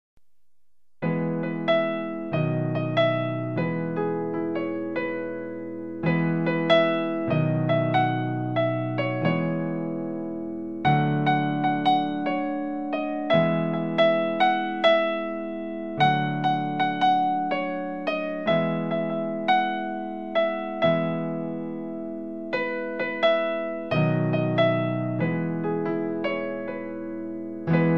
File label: Something Sweet (Piano)